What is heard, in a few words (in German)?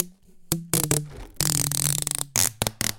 Klebeband